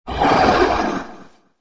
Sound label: roar; Dracolich